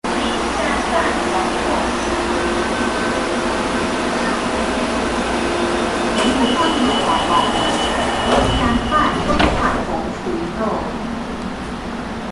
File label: Close, Faiveley Transport, Railway